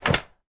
shorts drop on table; foley

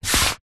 paper sheet a4 push by hand on table; foley

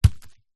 stone med drop on dirt; zapsplat; foley

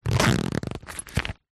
cool bag fabric zipper

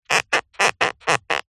plastic turn squeak creak; zapsplat

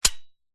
office staple gun dry fire

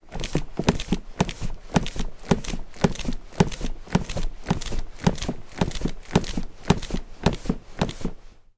toy rocking horse plastic rocking on carpet floor

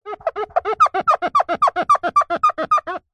plastic squeak rub finger fast; foley; zapsplat

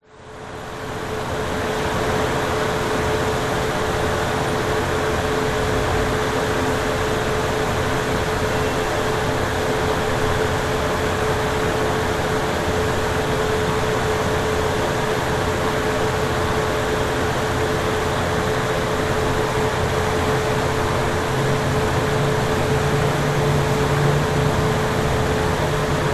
USA Laguna Beach Restaurant Outside Ventilation

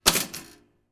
household toaster pop up

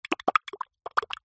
cartoon slime fast movement

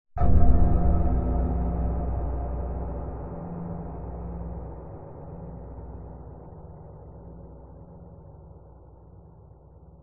horror stab dark scary cinematic

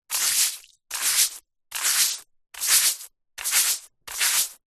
household sweep wet ground; zapsplat

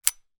gun 1911; weapon; still north media

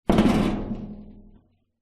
metal oil drum half roll over; foley

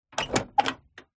truck door old open

